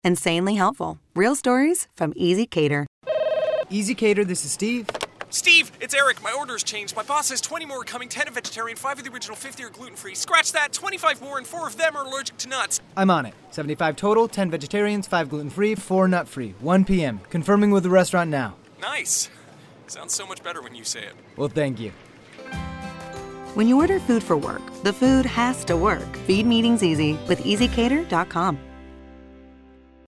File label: deep breath; ezcater